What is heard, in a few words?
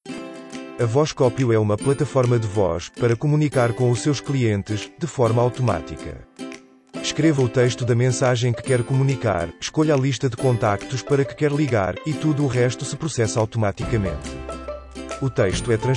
intro music